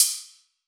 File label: Hihat Closed; Ableton Live 12 Lite; Core Library; Drums